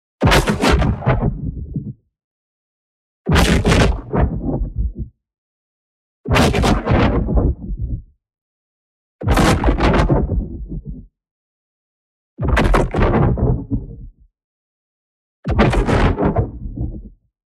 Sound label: EXPLOSION LARGE Shockwave Release; boom library; Matter